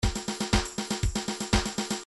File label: disco 1bar